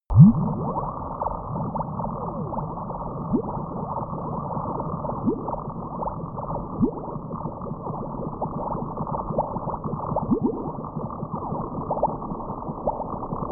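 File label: space bubbles low, science fiction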